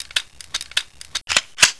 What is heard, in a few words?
shotgun reload; hl1